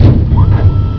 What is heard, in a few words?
hydro, doors; svencoop; quake1